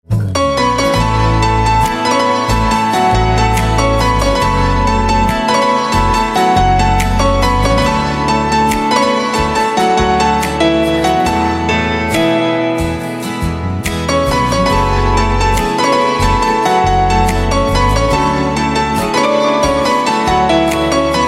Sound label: Piano Instrumental Ringtone